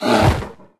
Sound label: Death; Barbarians Nanoq